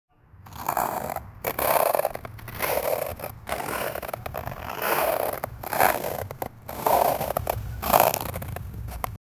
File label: ice skating sound